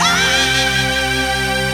Scream, Jungle; Vocals; Blu Mar Ten - Jungle Jungle - 1989 to 1999 Samplepack

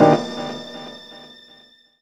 Mystical Chord; Jungle; Blu Mar Ten - Jungle Jungle - 1989 to 1999 Samplepack

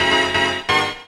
Fire Stab, 2B; Jungle; Blu Mar Ten - Jungle Jungle - 1989 to 1999 Samplepack

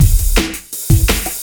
Technology Break; Jungle; Breaks; Blu Mar Ten - Jungle Jungle - 1989 to 1999 Samplepack

